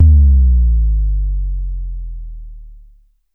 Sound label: Pollard Syndrum Sub